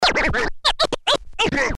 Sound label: scratch